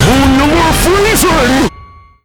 jumpscare; slender; xavier

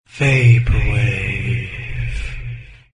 vaporwave; chatsound

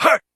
effort; tf

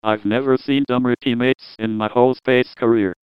jeers; John Madden

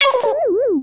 stabbed; tf; isabelle

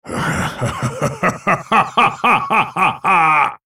laugh; volibear

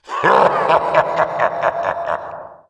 haha; predator

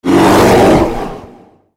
ultimate effort; Volibear

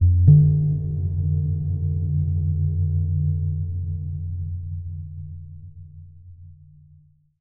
bloop; hl2dm; oppressive territory

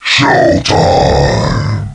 battlecry; bowser

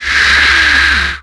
alien alert; xenomorphs

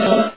fart high; hl2dm

